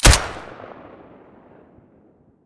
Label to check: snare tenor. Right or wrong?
Wrong